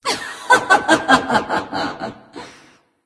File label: laugh; smite; arachne